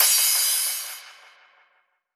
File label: drum low finish long echo